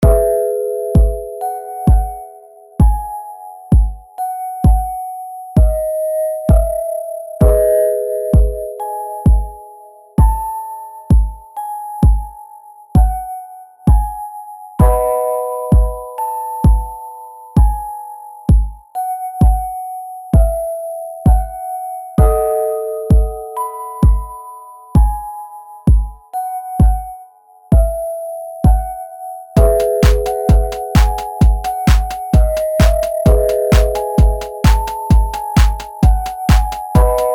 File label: little music box